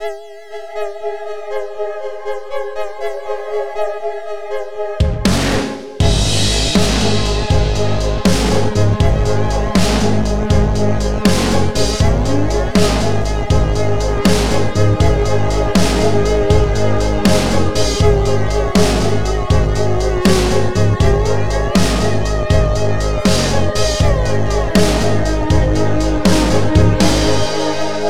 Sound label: EMISYNTH; Strix Instruments LLC